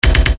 Bass Dr, EuroDance Bdrums

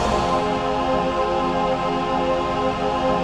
VOICEPAD; Best Service; XX Large Pads; Sample CDs

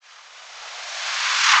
Reverse Fx; musicradar

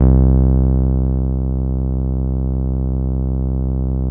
TB-303

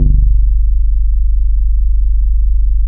TOMATO BASS, Bass Analog & Synth, GARAGE; USB Soundscan vol.12; AKAI

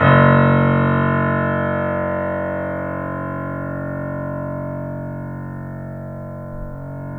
F# -R, The Piano; InVision Interactive - The Piano

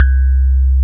TINE; Wizoo - Powered DX; DIGIJAZZ

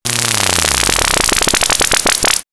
Ufo Collapse, synthfx; Concussion; X-Static Goldmine

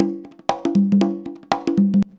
CONGA 119, Modern Percussion Loops

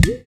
UDU, African Contemporary; Udus; Spectrasonics; Supreme Beats

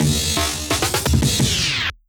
Fill, Loops, breaks; M8

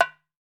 ROSEWOOD, African Drums

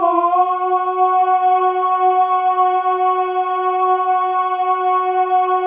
MALE AHS; Spectrasonics; Symphony of Voices

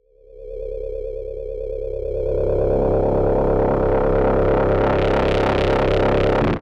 Ring mod FX

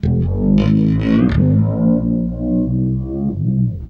REV, Guitar; East West; Steve Stevens Guitar Samples Collection

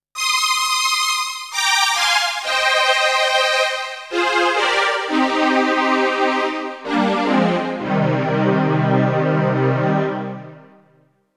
Strings; ZERO G - Datafile DISC 3

